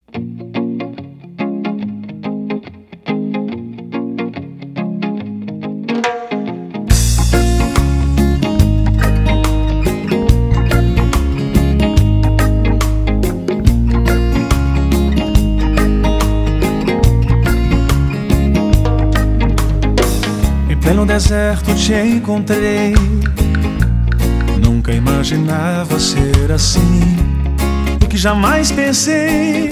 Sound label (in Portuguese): Reggae